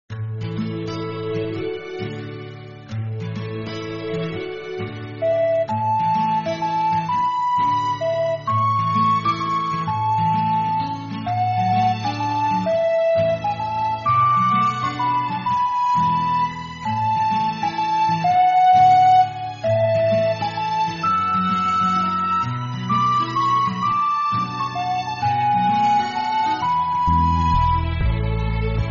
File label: bgm main; PlayfulTreeKingdoms